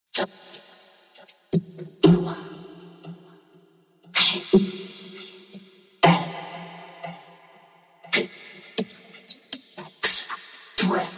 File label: electronic, 120, C, Acapellas; Electrische Musik